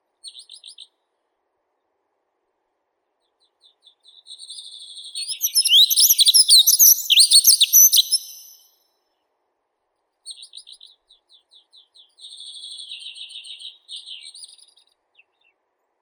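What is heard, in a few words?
dunnock, Prunella modularis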